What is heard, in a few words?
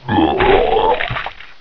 pain; quake2; ranger